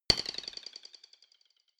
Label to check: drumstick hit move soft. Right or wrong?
Right